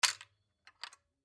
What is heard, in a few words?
Film Camera Arri 535 Quick Release Plate Come Off; Blastwave